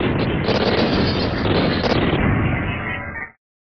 Erase Rocket; Mario Paint

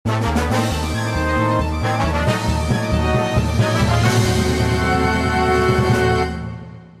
Castle Theme

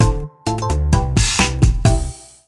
Losing a level in Star World

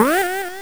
Sound effect of Trampoline; SMA2

